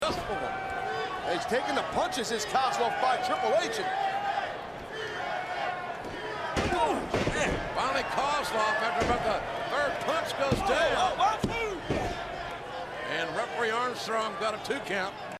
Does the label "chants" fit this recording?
Yes